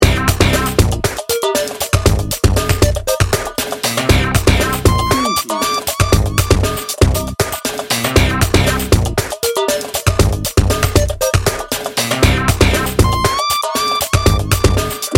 industrial innovation 60 second

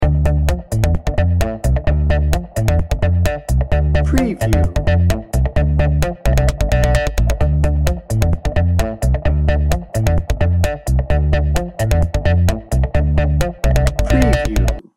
whole lotta perky loop; Cheerful, lively Latin track. Featuring punchy horns, sensuous piano with sax and trumpet

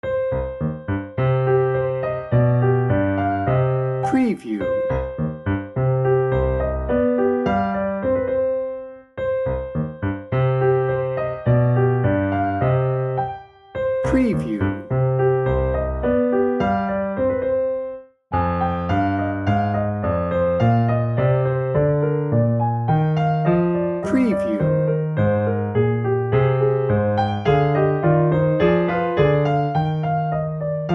baroque

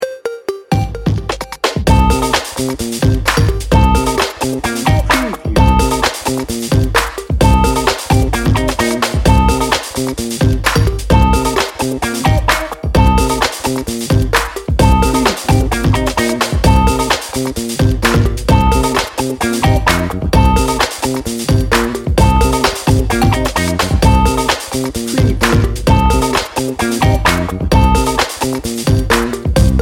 good time funk no hi synth

